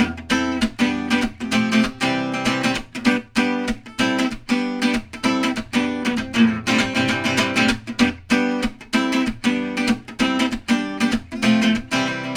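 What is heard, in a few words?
Acoustic Guitar with ambience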